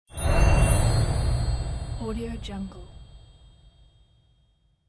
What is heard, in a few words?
magic